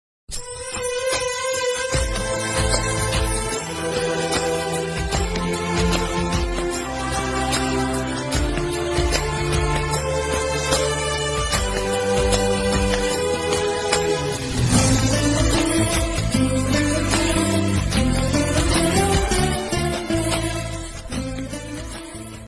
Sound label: love bgm, tamil; surya jothika kaakha kaakha